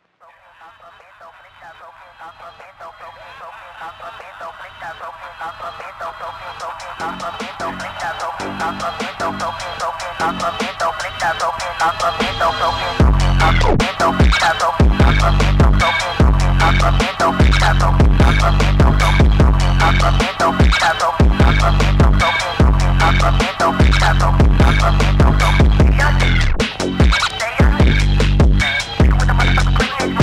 phonk

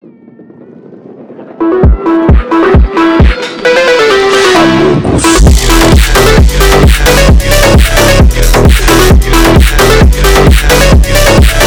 phonk remix

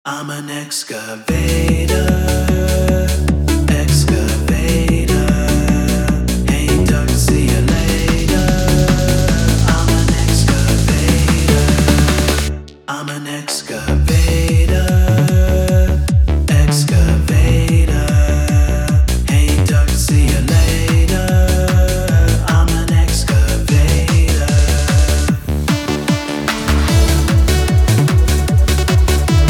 Excavator Techno Version

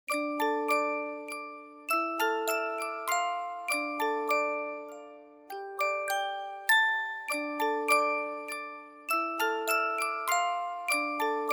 mystic lullaby reed version; turkish

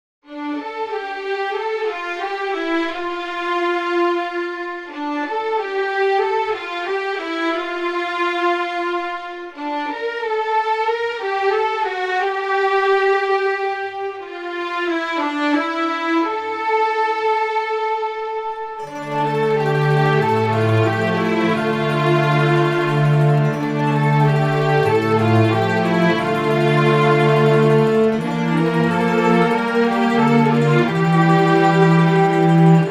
strings version; turkish

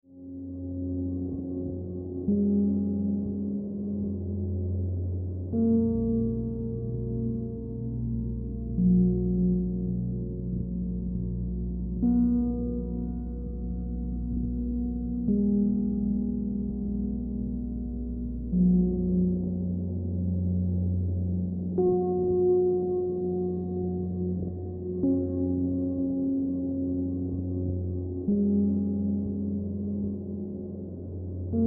aesthetic music calm aesthetic

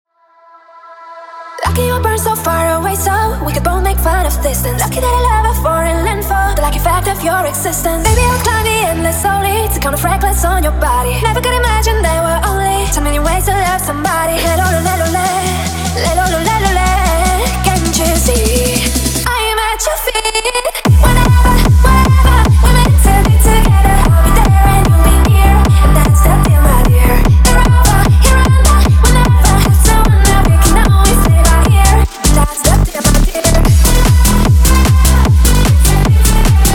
hypertechno

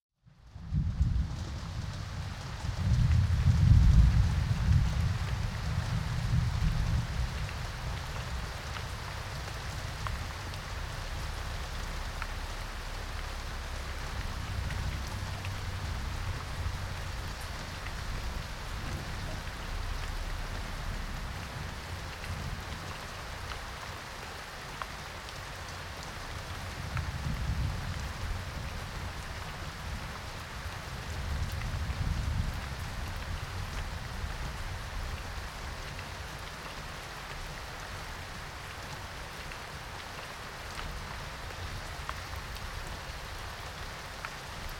thunderstorm

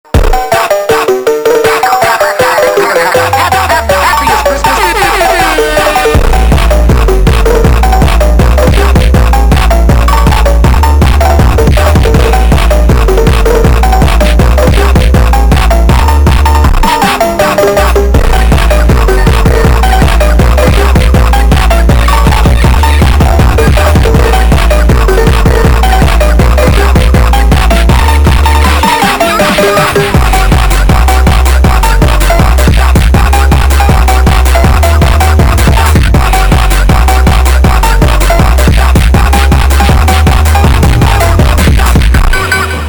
phonk

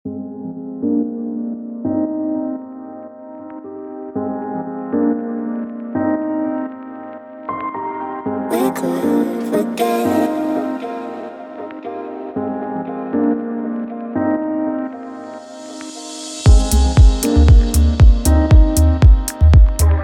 deep vibes

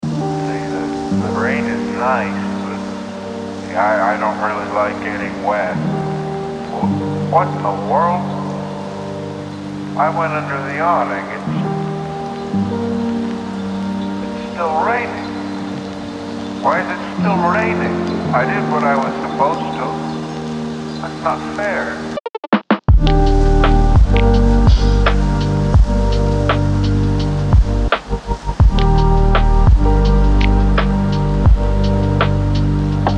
lo-fi hip hop rain in drain lofi hip hop

